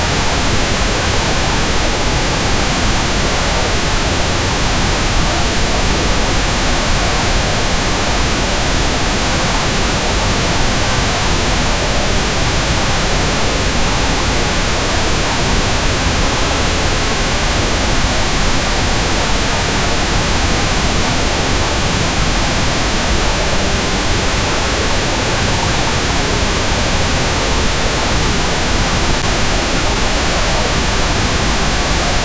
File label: pink noise